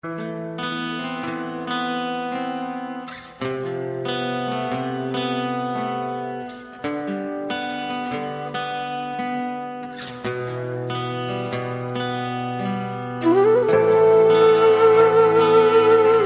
guitar and flute music

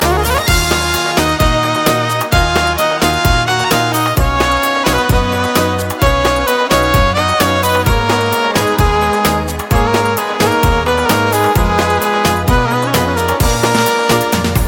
romantic violin

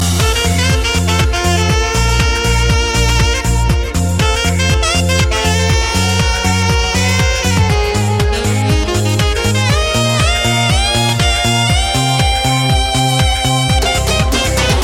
saxophone on rocks

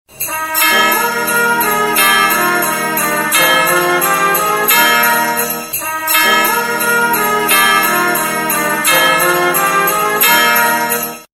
christmas swing

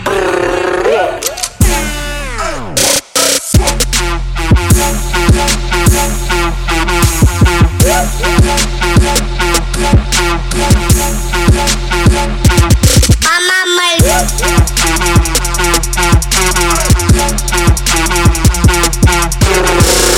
trap remix

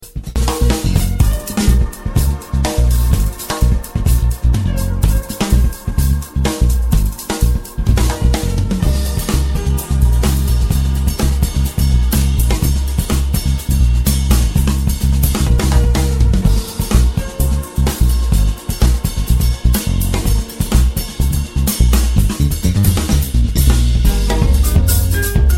jazz music

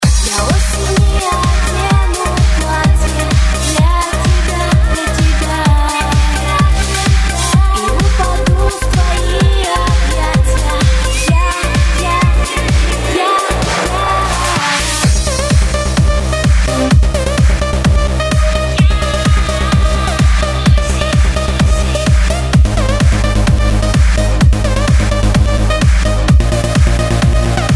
electro remix

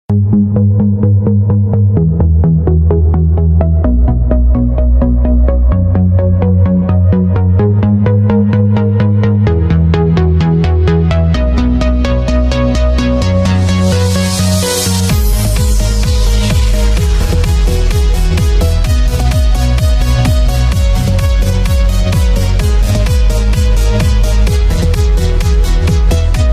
dance mashup

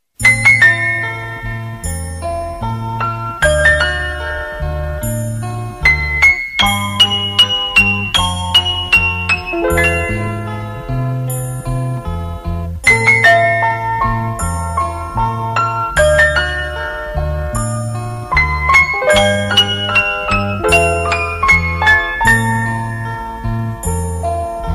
romantic melody

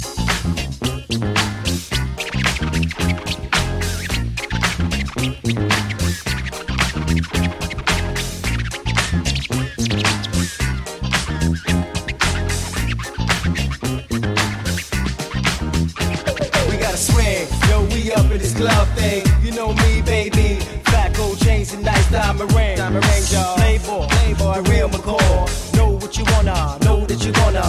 funky

